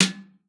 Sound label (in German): BESEN; DRUMS M; Xsample VOL-9